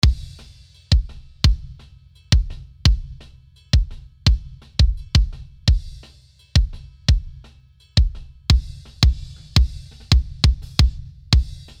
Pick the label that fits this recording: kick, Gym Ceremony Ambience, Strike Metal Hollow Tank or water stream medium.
kick